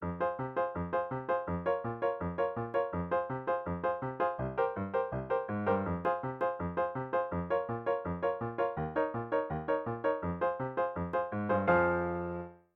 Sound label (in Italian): anni '40